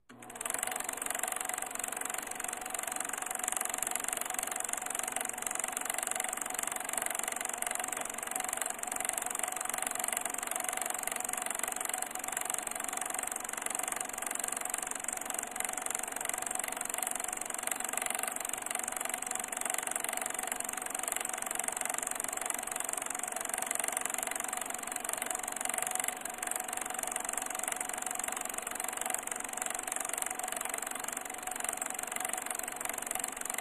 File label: Film Projector, 8mm, On Idle Off, W Clicking Sounds